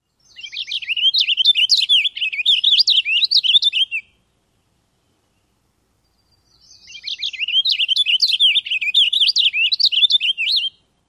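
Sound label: song, purple finch; NY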